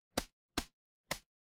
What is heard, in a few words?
ui toggle